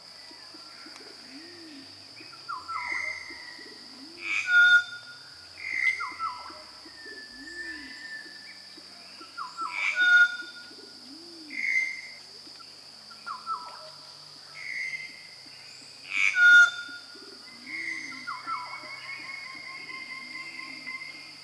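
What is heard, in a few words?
bird concert in Papua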